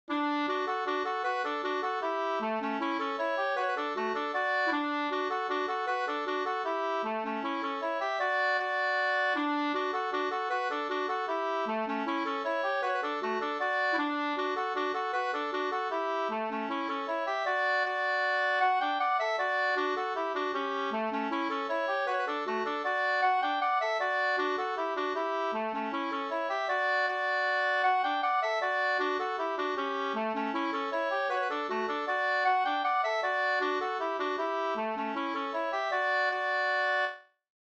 hambopolkett; Konjaken kostar en a sjuttifem Sd 2st

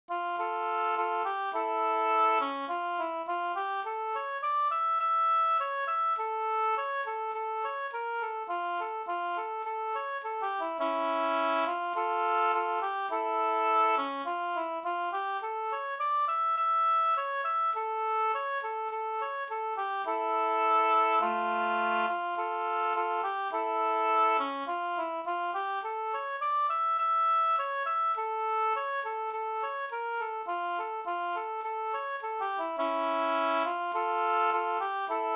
brudmarsch; av DJ Lidzell

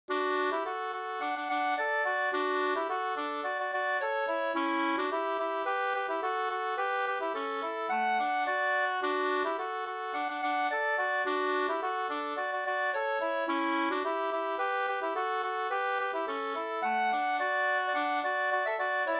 polka, D dur

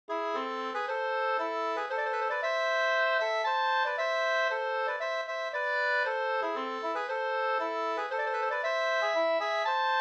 polska

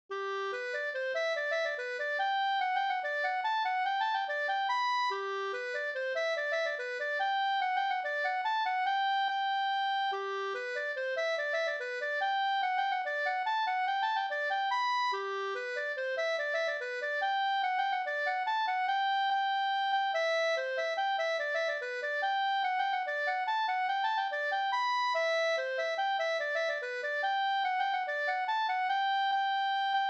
mazurka

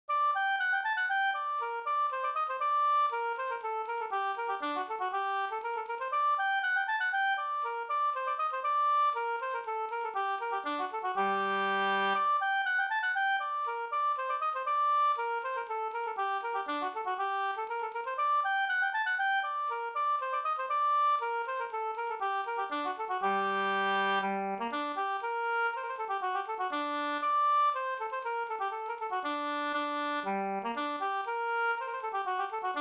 polska Bingsjopolska; Langtan av Pekkos Helmer

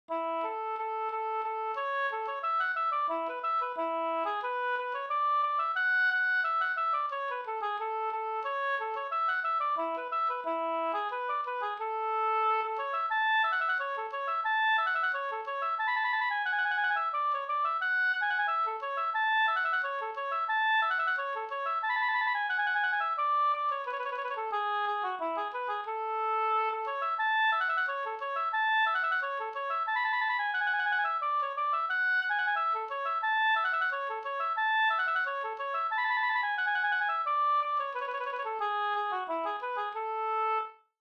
vismelodi Soldatvisa

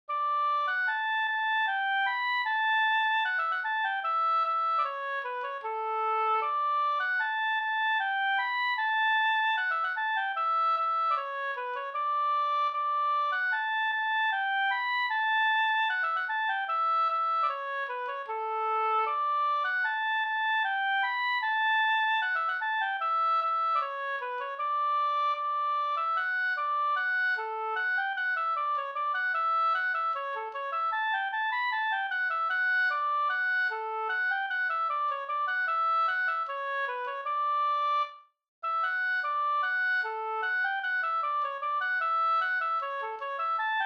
ganglat marsch; e Jan Calsa Vr

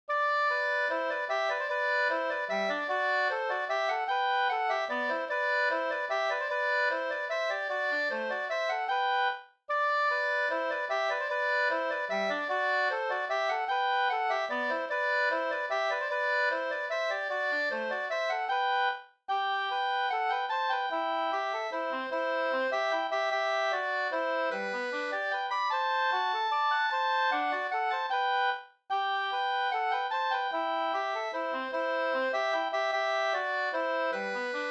vals; Osteraker

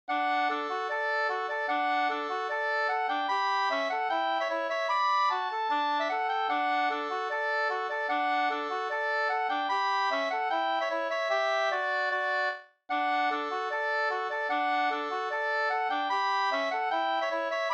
schottis 4-4 Ddur

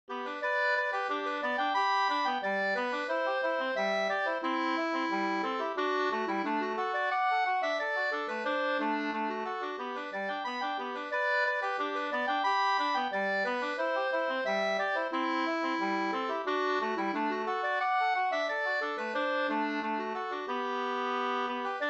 ganglat Gdur; Elg Anders; Rattvik

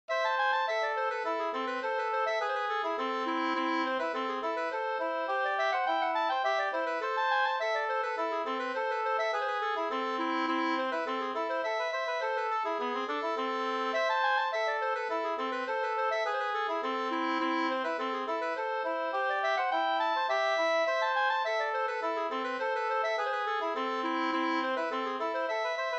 slängpolska

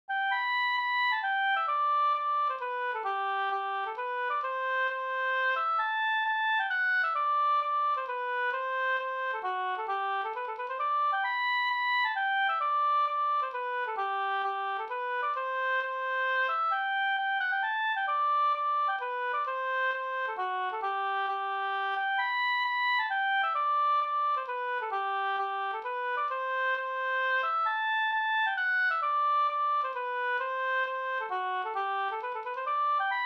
hambopolska

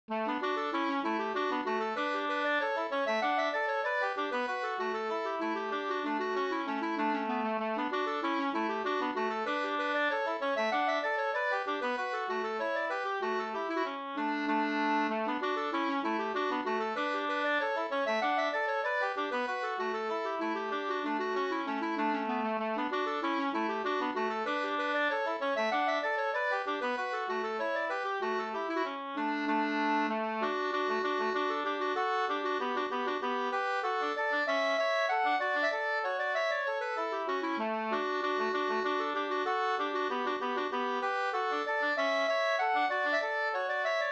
slängpolska

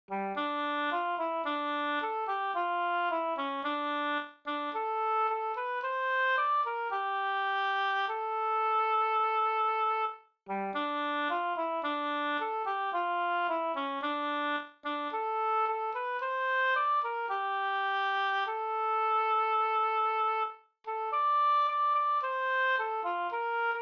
vismelodi ballad

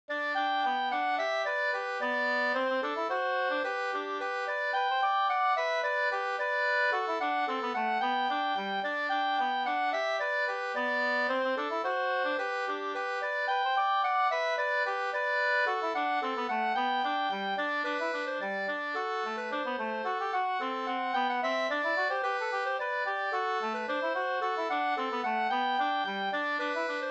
polka polkett

